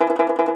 T. BANJO, Tenor Banjo, Plucked Strings; E-MU Producer Series Vol. 7 – Old World Instruments

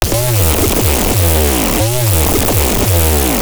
processing E 340BPM, bass

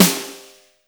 SNR SNAPPY, Snares; Roland - Rhythm Section

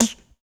HUM, Human Perc, Body Perc; Spectrasonics; Supreme Beats; World Dance

